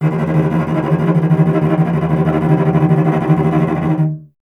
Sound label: STR VC D#1, Cello; Miroslav Vitous; Solo Instruments VOL-1